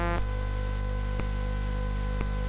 Dark Castle, CSG8580R5

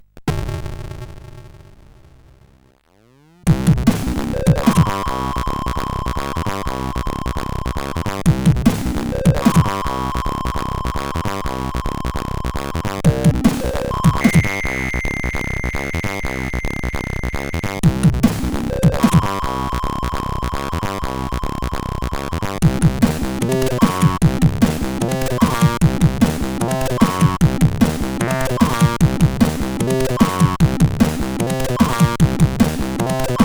Introduction, CSG8580R5

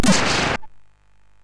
Shuffle, MOS6581R2

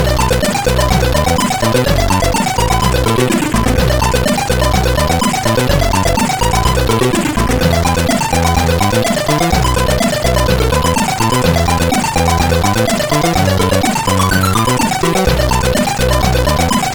sid CSG 8580 R5; Ikari Union

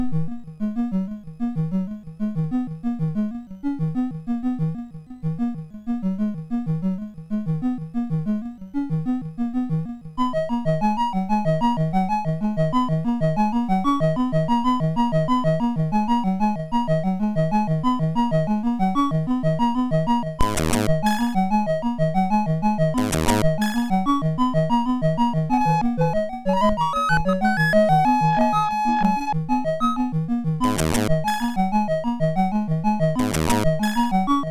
II, MOS6581R3; Shake; Luca

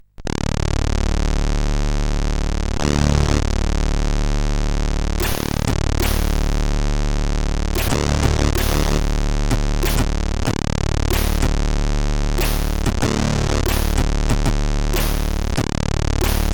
Funk, CSG8580R5

